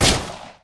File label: bullet; Risk of Rain